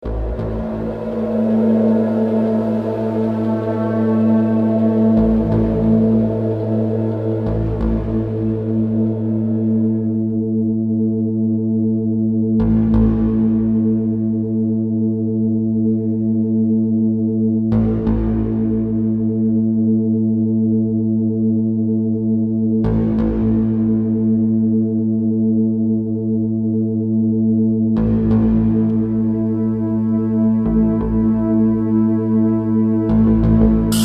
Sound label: tension music